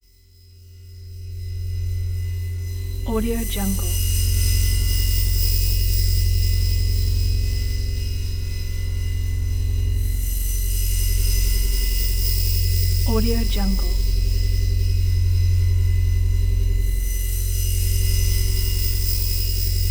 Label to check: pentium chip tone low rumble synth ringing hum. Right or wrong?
Right